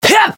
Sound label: Voice attack; curio